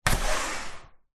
household window slide up open; zapsplat

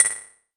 multimedia rollover; sound effects one

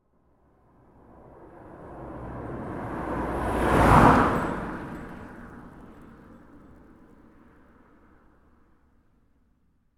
transport car bmw pass country road

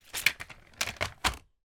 household storage basket plastic fold close

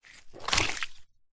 wood stick movement sludge wet mud squelch; foley

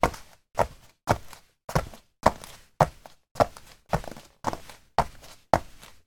footsteps on soft foam play mat; foley

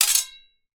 swords x 2 hit lightly

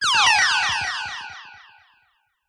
Blasts Synth Delayed Oneshot; sound spark

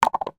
household aerosol can lid down on wood surface; zapsplat

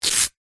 surfboard leg rope leash velcro rip

